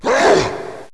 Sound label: attack zombie